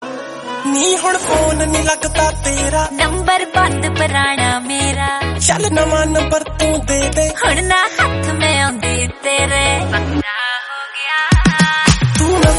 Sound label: punjabi